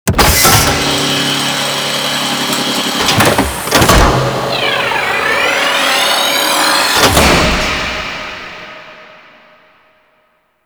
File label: Space Shield Generator Open; Space Battles